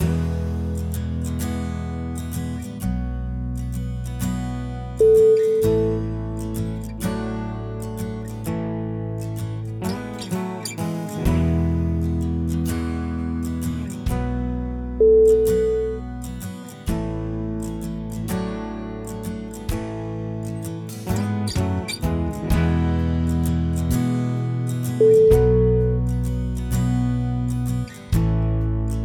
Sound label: american countryside